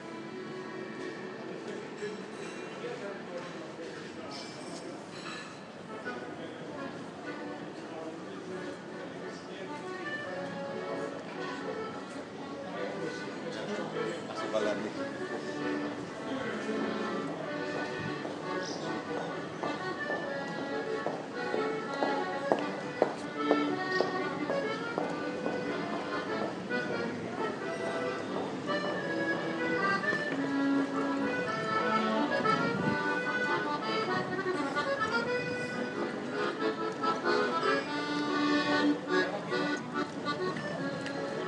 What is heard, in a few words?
hamburg in sound